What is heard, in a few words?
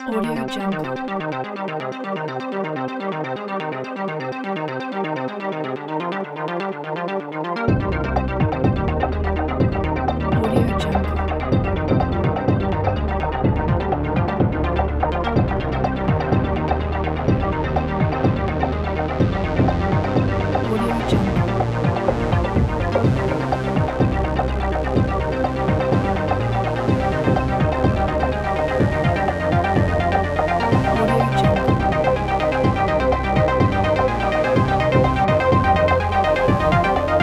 electronic technology evolved